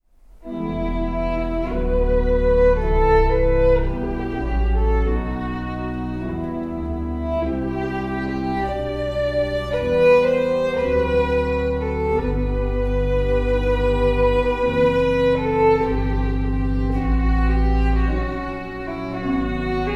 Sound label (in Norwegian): fiolin